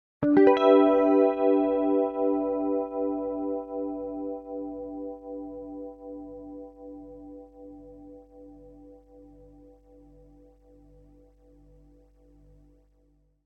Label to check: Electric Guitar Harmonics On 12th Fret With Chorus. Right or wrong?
Right